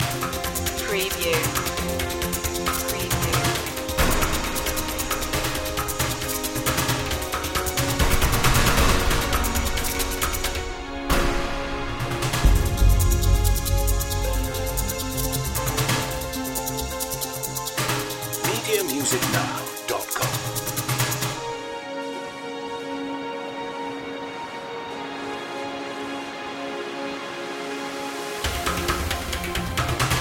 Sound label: final frontier loop